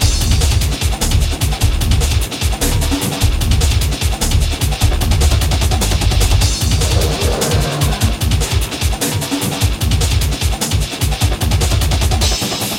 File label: mod comparison sample; razorback musicdisk